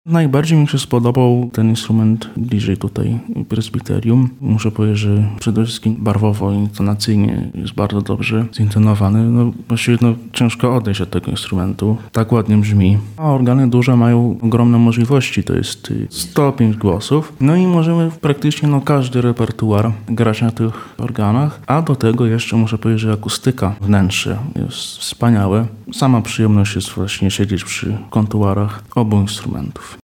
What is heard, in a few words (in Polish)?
organy